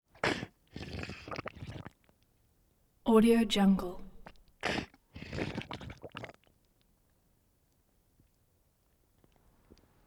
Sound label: mud breath wet gasps gurgles in thick liquid; prosoundfx